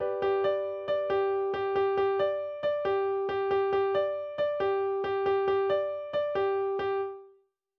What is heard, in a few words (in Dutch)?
voorspel